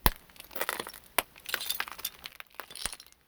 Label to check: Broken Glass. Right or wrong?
Right